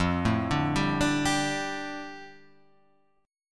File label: D Suspended 4th F, guitar; Dsus4/F; arpeggiated; JGuitar